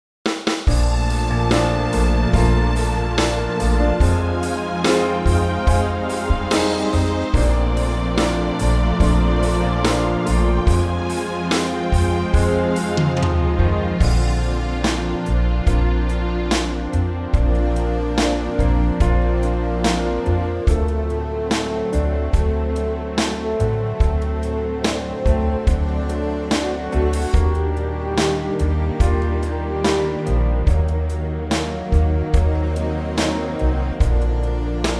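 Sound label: orchestra Bb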